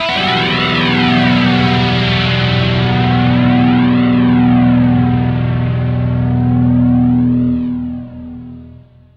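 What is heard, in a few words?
dirty guitar, destination rotation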